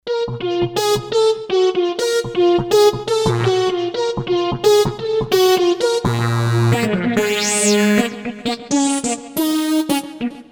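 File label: Noisy Lead, Kawai K5000S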